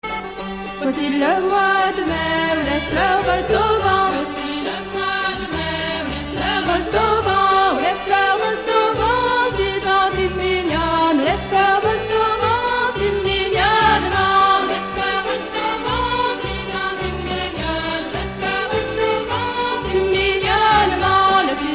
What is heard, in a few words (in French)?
folk breton, rond de sautron